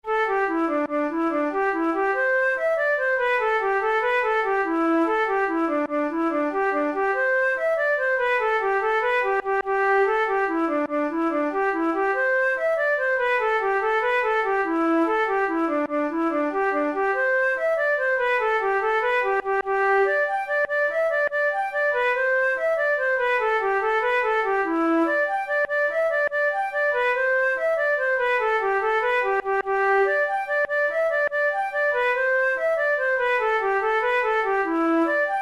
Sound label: jig, flute